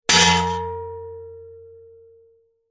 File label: sword strike metal could be shield; zapsplat